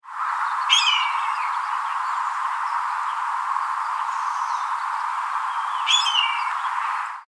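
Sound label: Steller's Jay Red-tailed Hawk call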